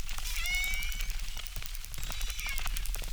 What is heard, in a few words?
Chirp zap, acquarium